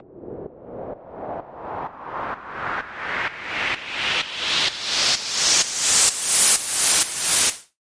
club risers

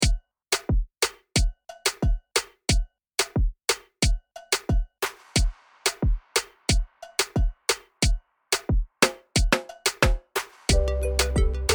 zouk

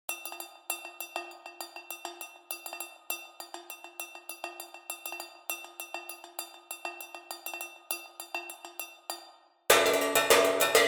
metal example

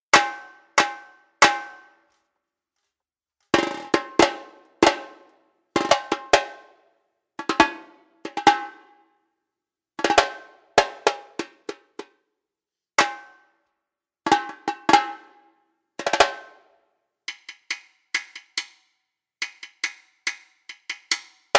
repinique single hits dry

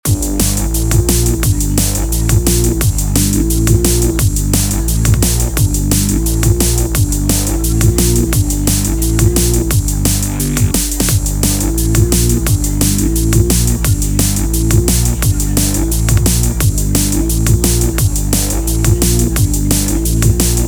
drum bass

